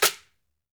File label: SHAK, SHAKERS; Best Service Dance Mega Drums